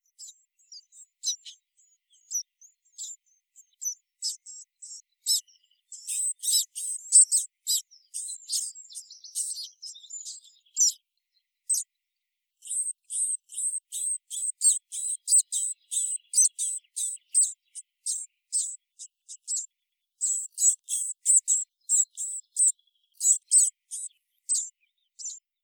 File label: Poecile palustris; SETE